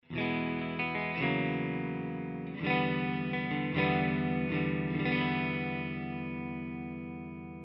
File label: L6 clean amp